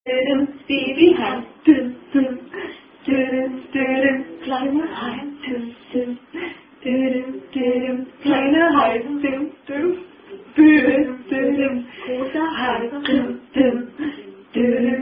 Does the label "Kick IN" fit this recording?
No